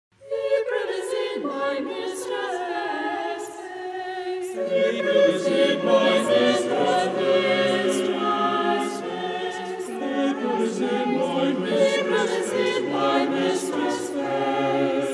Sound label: rec chor